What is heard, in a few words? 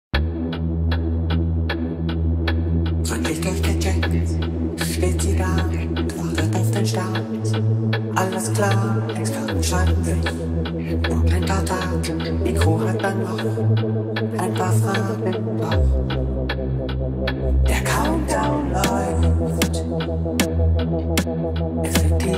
techno remix